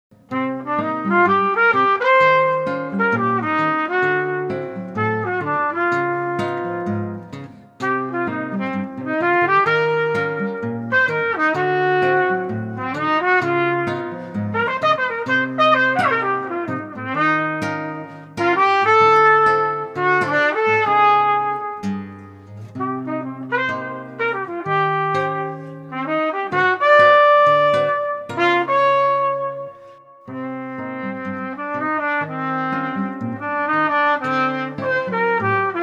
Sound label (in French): Sombrero Trompette guitare